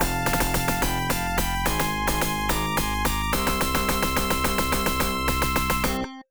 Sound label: Key Item Recieved; magical hat no buttobi turbo daibouken; genesis